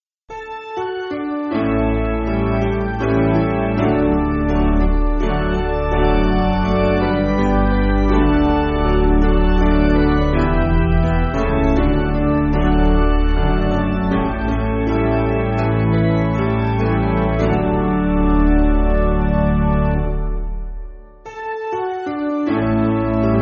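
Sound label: Piano, Backing Files for Churches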